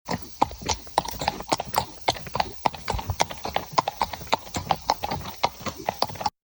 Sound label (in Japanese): uma aruku